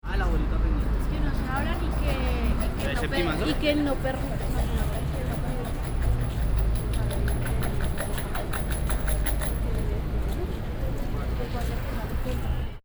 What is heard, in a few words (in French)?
attraction mendiant